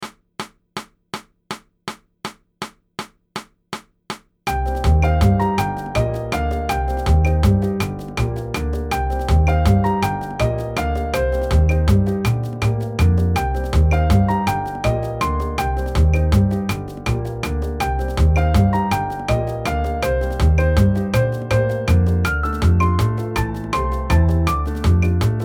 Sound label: click